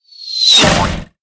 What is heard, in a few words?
Beaten Sign Swoosh Hit; Angry Birds